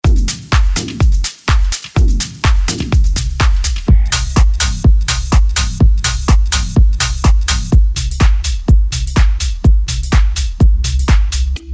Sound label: Tech House Top Loops